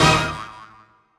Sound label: BGM Roulette Hit; Yokai Watch Busters 2 Hihou Densetsu Banbarayaa Magnum Sword